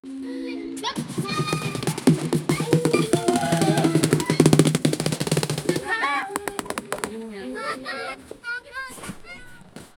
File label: upbeat playful fair music, festive